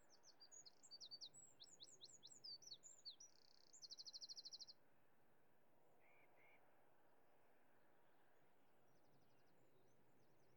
Morning Ambience; F4A; Waking up Beside You; GFE; Slice of Life

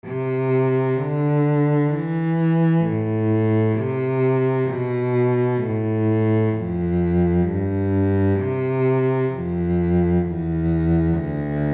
twin cello

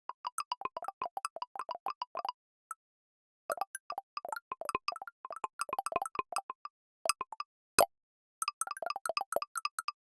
creepy crawling beings; the lonesome planetarium

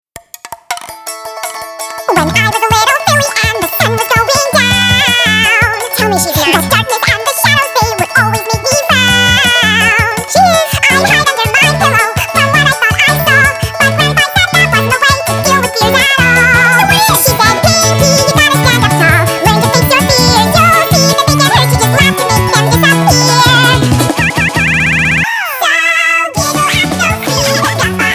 Vocals; Friendship is Traumatizing - Season 1

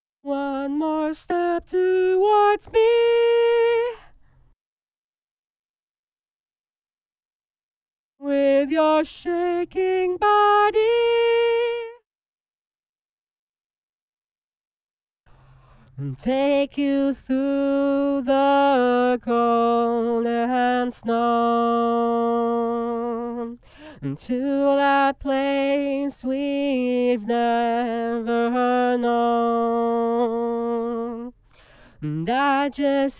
extra harmony high, Dry Vocal Stems; 120BPM

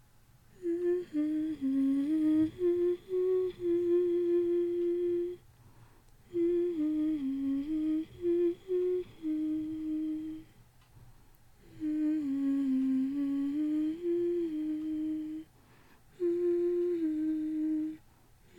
Lullaby

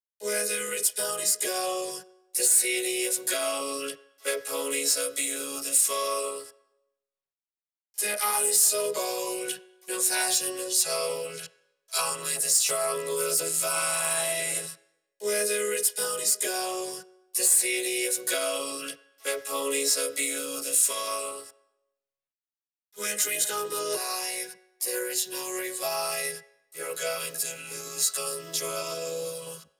chorus backing; 128BPM

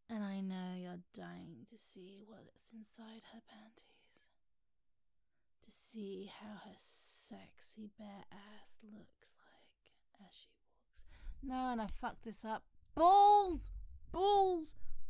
Growl Outtake; Balls

